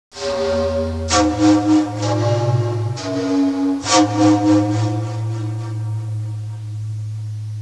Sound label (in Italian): musica strumentale